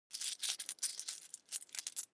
money coins search; foley; smartsoundfx

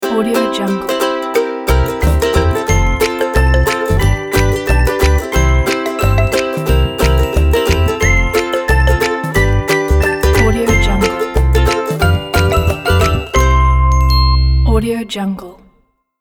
children ukulele and toy piano

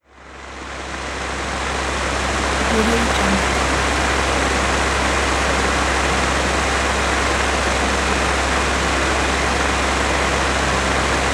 big room fan; ducentije

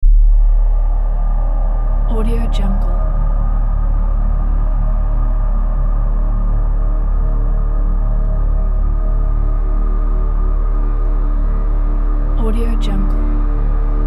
dark tension drone

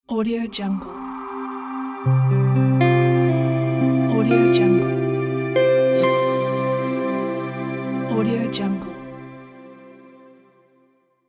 elegant logo

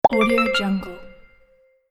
pop and reveal

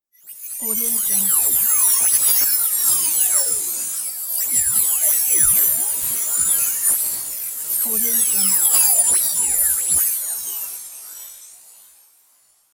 bad signal glitch

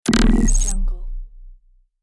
soft holographic error

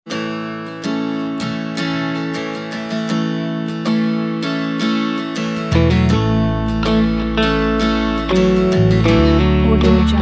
wholesome americana country

